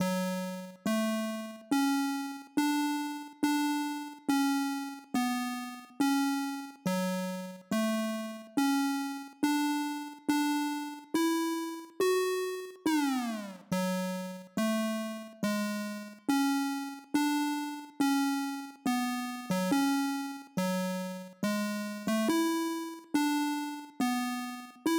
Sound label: Melody; chippy